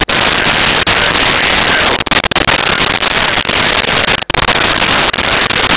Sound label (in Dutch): nieuwe mail